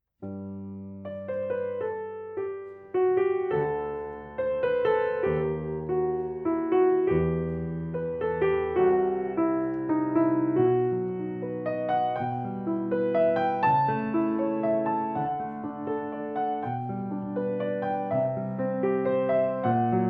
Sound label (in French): voix et piano; IUAV